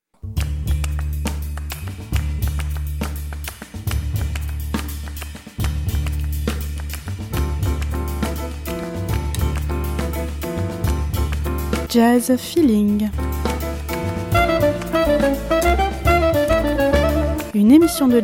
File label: jazz trio; rfl en touraine; cultisongs